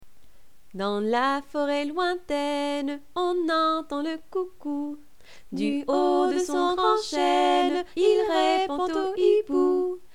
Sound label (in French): chant; Dans la foret lointaine